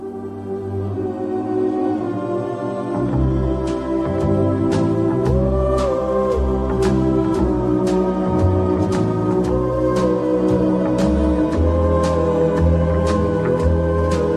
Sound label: Instrumental Ringtone for Mobile